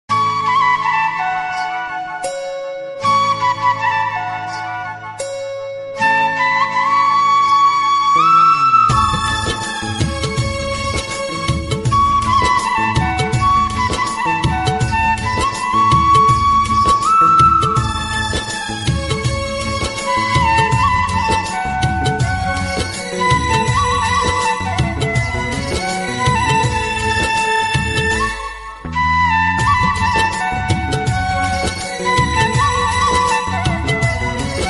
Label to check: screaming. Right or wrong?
Wrong